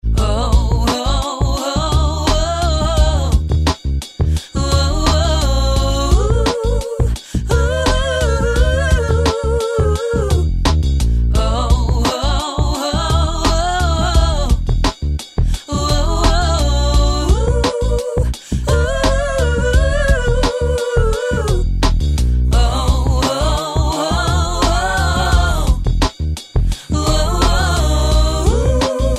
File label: licks exercise